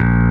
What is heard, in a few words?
bass